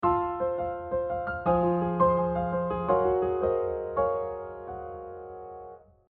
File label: altered common tone modulation